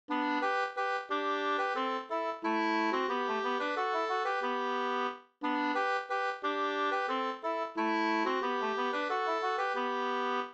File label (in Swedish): vismelodi